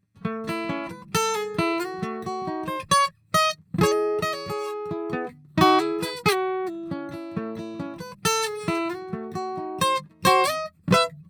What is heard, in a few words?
Collings D2H Sanken CU31, guitars